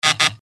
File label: buzzer; wheel of fortune